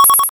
Coin Count Goes Up

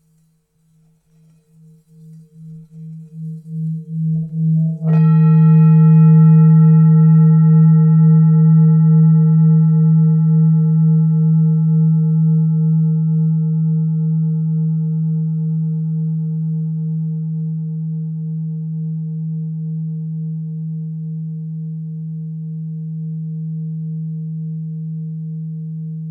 Tibetan Bowl

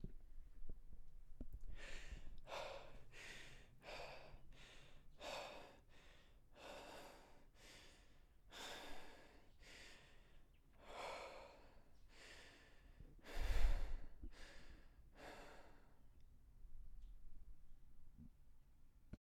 Man Out Of Breath

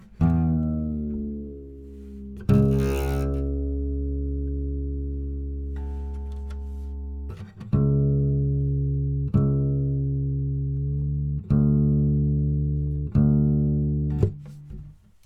De Tuning A Guitar

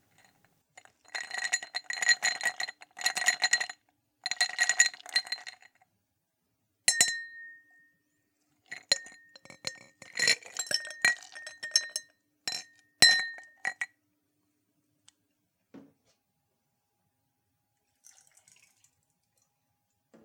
Glasses Cleaned

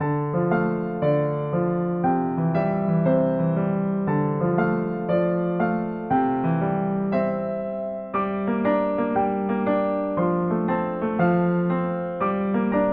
Piano Loop

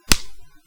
Break

